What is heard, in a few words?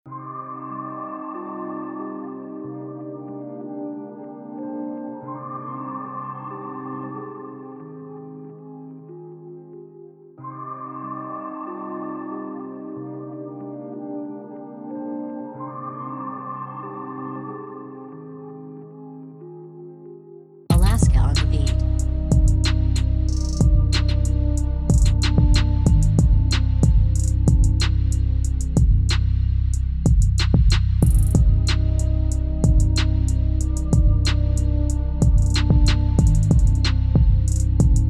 sad ambience